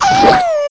wolfos pain; zelda

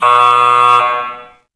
buzzer; svencontra2

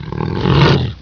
idle, ogre, monsters; svencoop; quake1

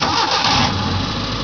car start

